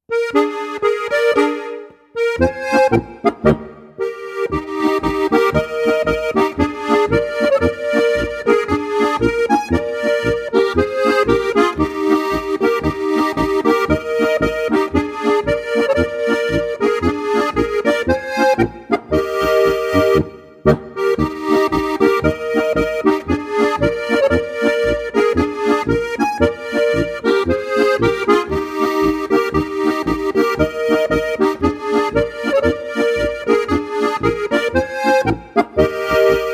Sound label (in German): polka